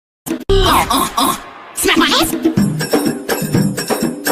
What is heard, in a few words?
gulp; india